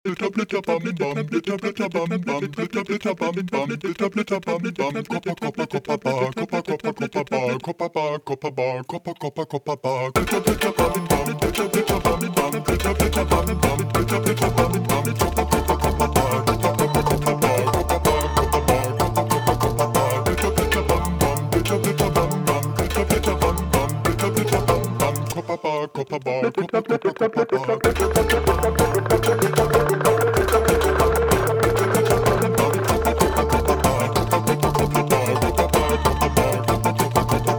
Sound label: stab fast music; revision25